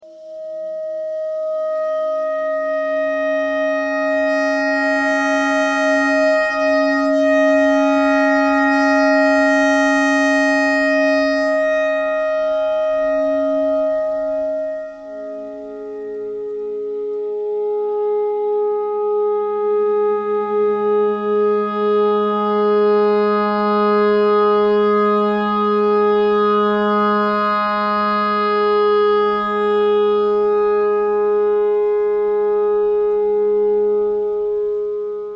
clarinet clarinet notes e simple

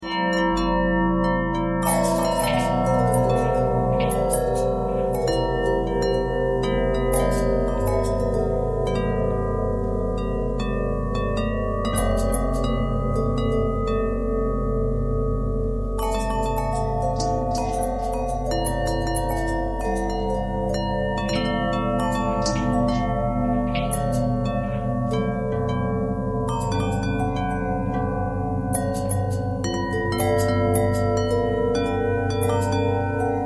long bell bell twist

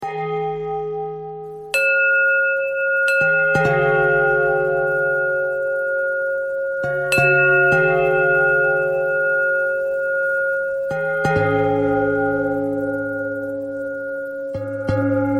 bell bell thread simple, bells

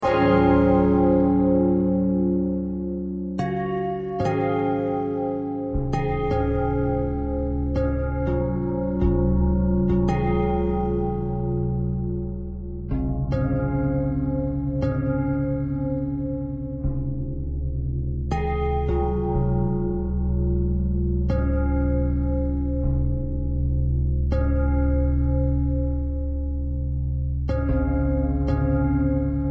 bell thread pentatonic

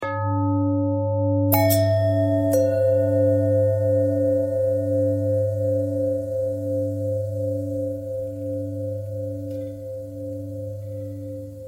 longbell bell bendthread

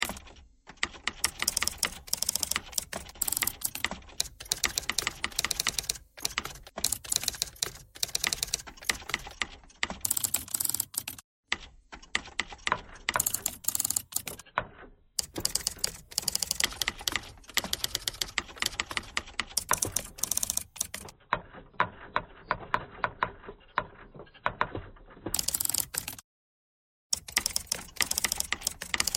typewriter thread pentatonic

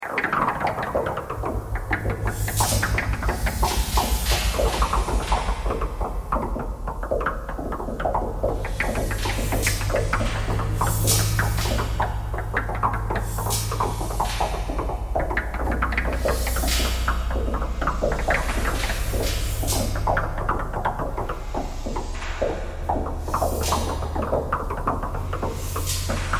knocking thread noise all

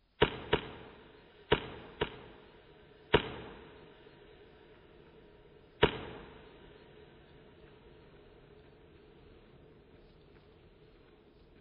clapping thread noise

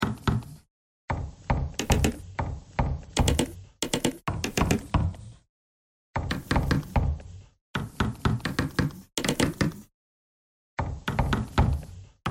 bagpipe noise

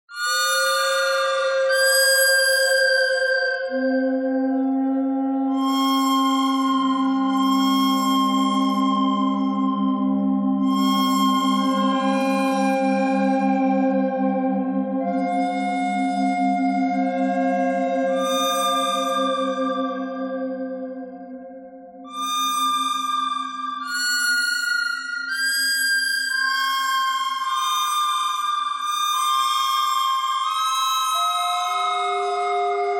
bird thread pentatonic; noreverb

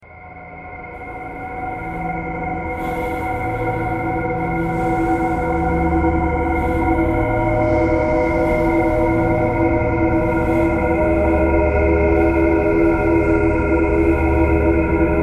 traffic kcore birds a thread simple; bowedmetal

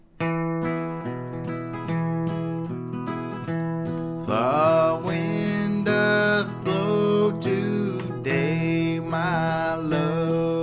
unquiet grave

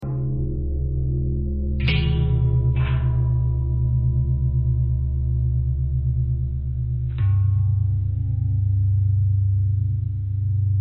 long bell bell thread pentatonic, bells

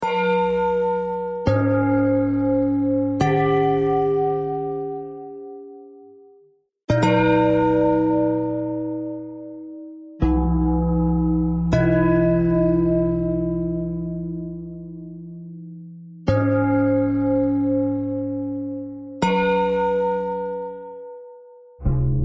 bell thread bend thread

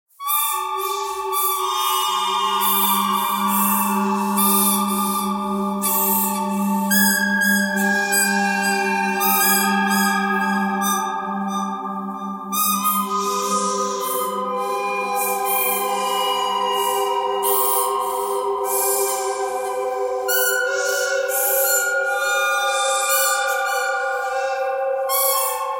bird canyon thread harmonic

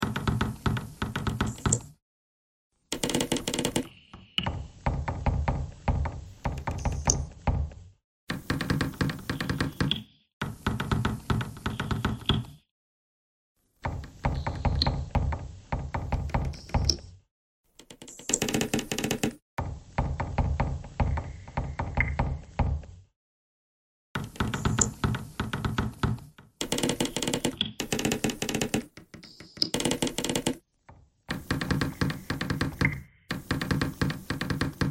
bagpipe noise

